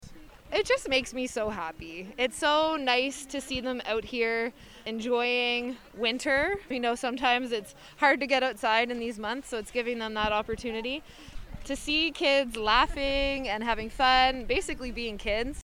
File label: Kids Being Kids